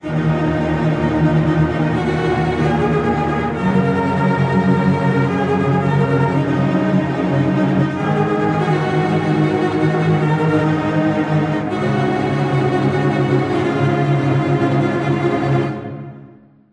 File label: Wild west stinger